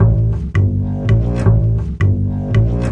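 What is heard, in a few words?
165 acoustic bass darkcircle; MELODIC LOOPS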